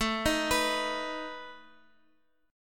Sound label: A Suspended 2nd Flat 5th A down Guitar; Asus2b5 arpeggiated